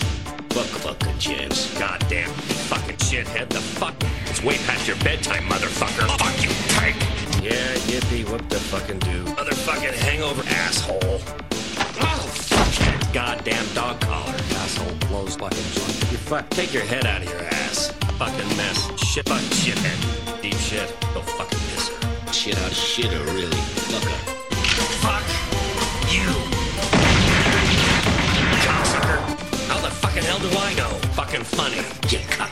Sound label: RINGTONE